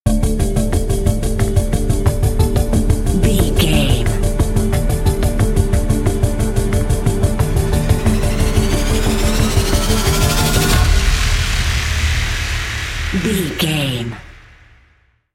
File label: dangerous developments bumper